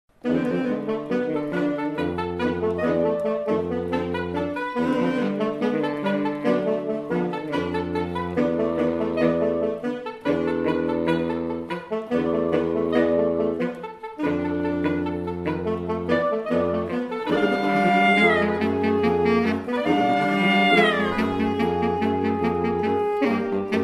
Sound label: Sax kolo